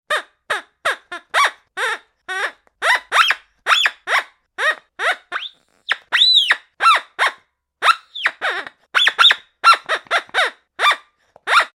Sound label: Toy Duck Quacks and Squeaks - Various; Toy Animals; Toys; Tom Hutchings